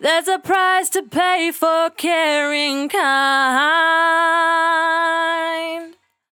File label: 68bpm D, Velvet Vocal; Zero-G